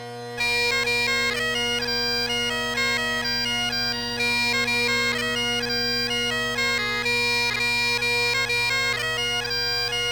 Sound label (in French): Polka